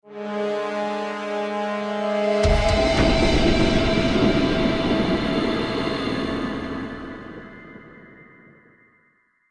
Stinger Decrease Action

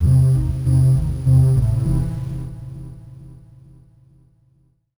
Retry Jingle; Turn Back; Friday Night Funkin' Lost Silver fan songs PC 2022 gamerip

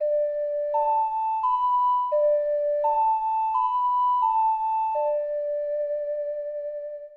Ocarina; Legend of Zelda The Majora's Mask 2000 n64

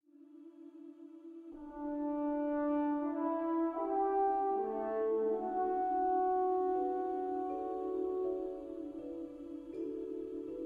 World Music; Jurassic World Evolution 2; PS4; Windows; Xbox One; Xbox Series X/S

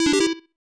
Slot Start; Hisshou 777 Fighter; SNES; Continontal

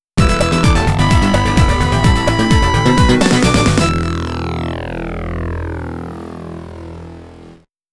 Round Clear; streets of rage

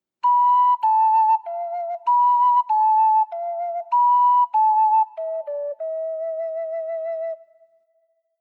Ocarina

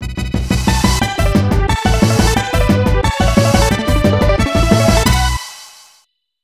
Level Clear; Outer Space; Claymates; SNES

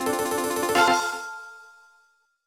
Jingle Code Generation; My Baby First Steps 2009 NDS gamerip

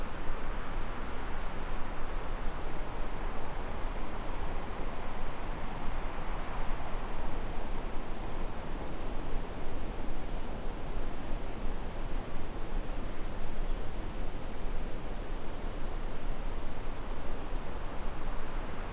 AMB GARDEN AMB GARDEN

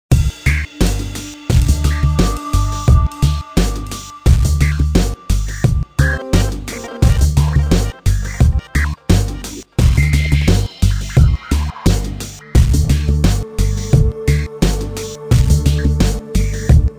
Music Bar

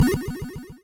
speed Boost; Dino Run

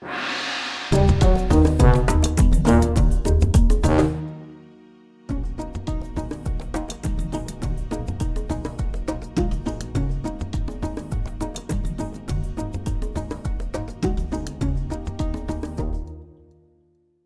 Minigame Hippo Lose

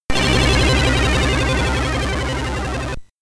Ship Lost; Island of Dr. Destructo; Commodore 64

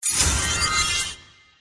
Collect; the great ottomans strategy battle for throne android

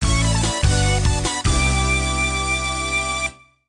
Puzzle Clear; Pac Attack

